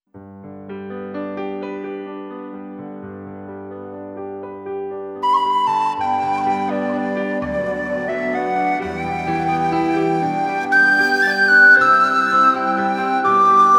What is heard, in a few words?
Cool-Down, exam music